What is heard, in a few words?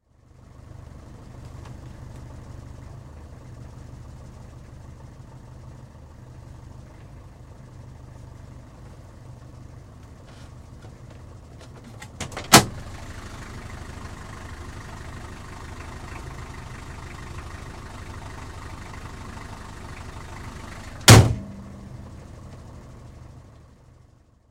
Austin Taxi, interior, tickover, door opens & shuts (Austin Diesel Taxi Cab); Car; BBC